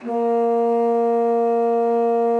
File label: BASSOON A#3; Xsample VOL-6; C BASSOON